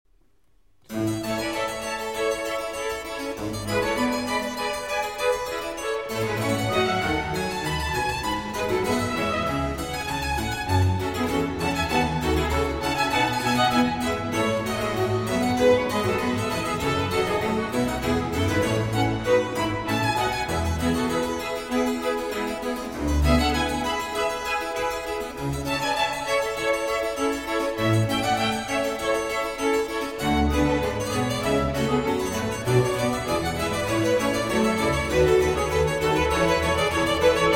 Keyboard Concerto For Four Pianos in A Minor, Allegro; Bach, JS